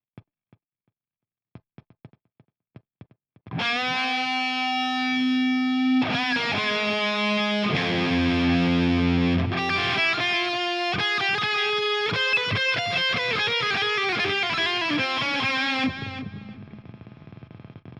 Guitar

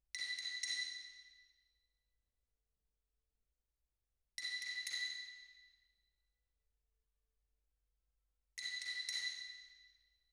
Xylo

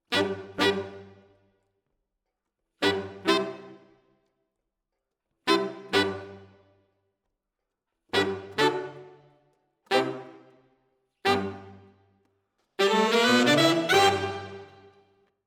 SAXES

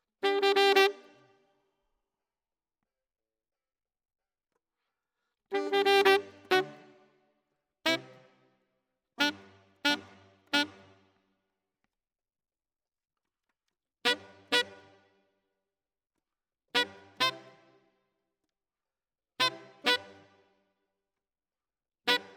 Alto, RNR for piano

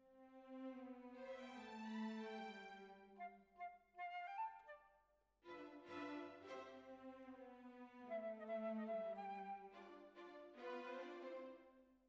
Clarinet

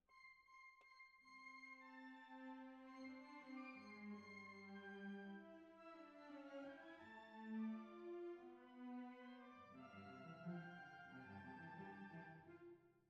Cbasses

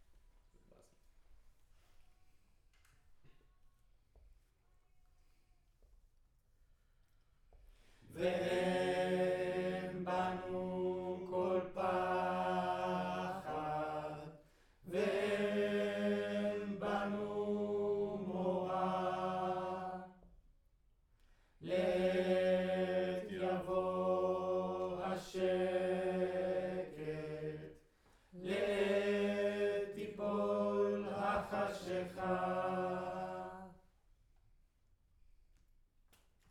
Room, Choir

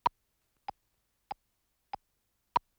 Tape Click

